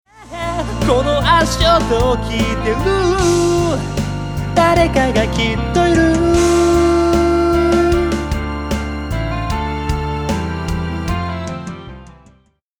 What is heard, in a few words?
beats